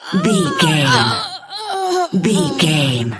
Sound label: voice female death